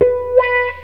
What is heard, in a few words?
MEOW, Distortion Gtr; PlatinumPhattCD2; E-MU Producer Series Vol. 8 – Platinum Phatt